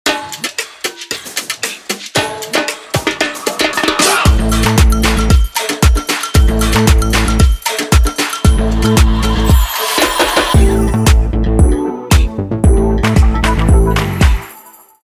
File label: Gliss